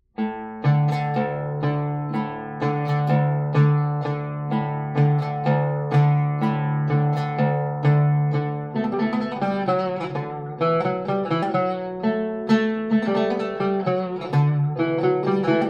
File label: Rast Zeybek, oud